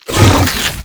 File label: Flying Mouth Attack Inhale End; Enemies; Warframe; ManInTheWall